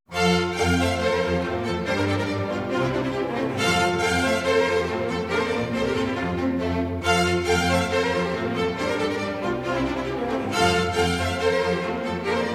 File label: Orchestra, Presto; Franz Liszt Chamber Orchestra